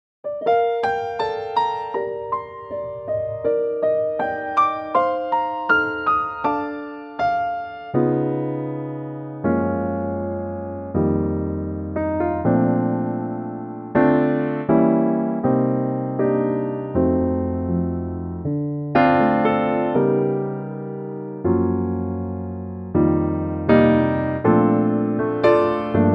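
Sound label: piano B flat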